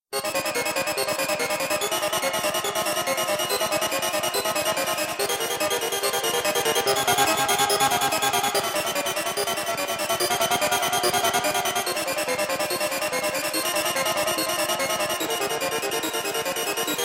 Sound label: Prelude in C minor